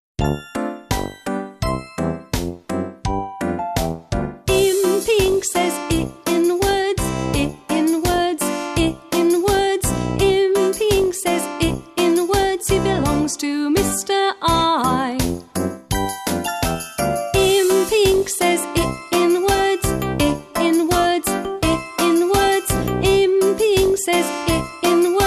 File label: ALPHABET SONGS; IMPY INK